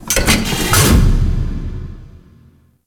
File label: Claw Attach; Gameplay; Warframe; Corpus Railjack; Comet Shredder